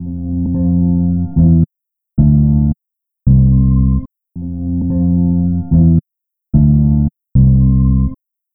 Bass, Trip-Hop Loops; Sonic Foundry (Sony Creative Software) - Rondo Brothers Trip-Hop Loops & Samples; Apologists

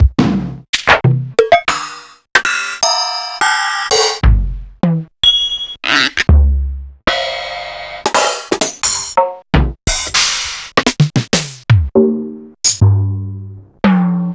Akai MPC500 Lo-Fi

